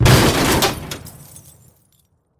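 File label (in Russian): ringing loud short explosion sound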